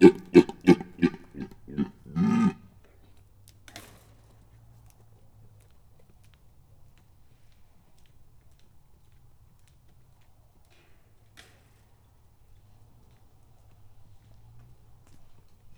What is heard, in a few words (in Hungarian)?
gímszarvas felbőgés kerítészörgés; budakeszi zoo 2010